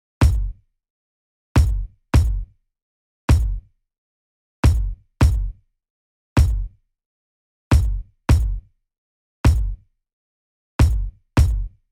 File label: kick nomal